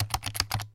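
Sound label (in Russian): щёлкание по клавишам клавиатуры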